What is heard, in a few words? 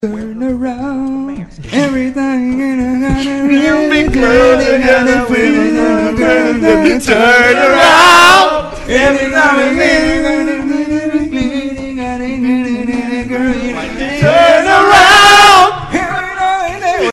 Turn Around Men Singing